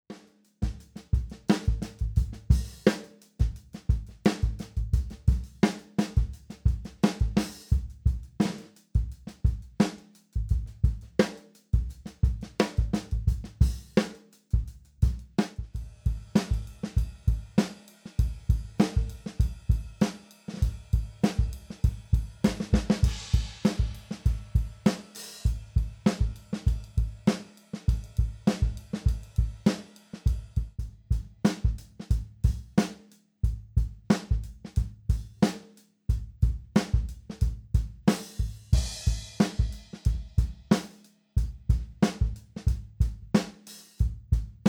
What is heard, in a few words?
drums